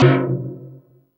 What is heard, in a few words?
Synth Perc; Dream Zone